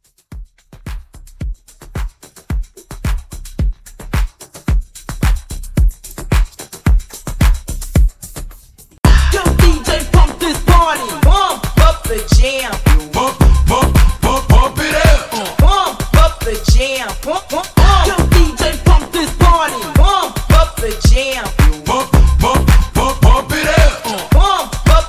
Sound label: Hype 110